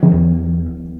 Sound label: roomy tom drum one shot d key, tom sound tuned to the D note. Loudest frequency: 180Hz